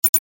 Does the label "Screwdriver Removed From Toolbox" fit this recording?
No